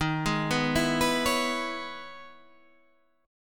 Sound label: E Major 13th chord